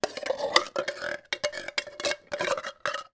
food can soup empty scrape out last bits with spoon; zapsplat